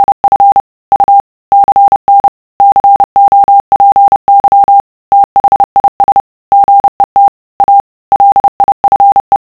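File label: cw message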